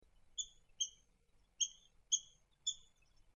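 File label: common moorhen call; ban nakigoe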